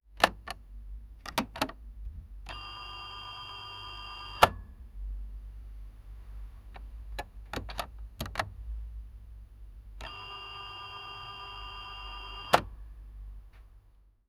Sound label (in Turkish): 5 saniyelik klasik, ringtones